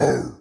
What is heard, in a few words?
hurt; FlyForInsanity